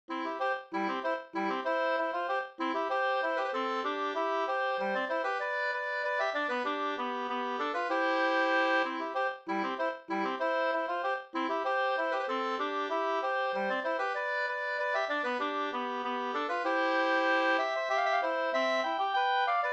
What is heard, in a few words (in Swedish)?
polka polkett; fr Lovsta bruk Nr3 e CoH Wallin 2st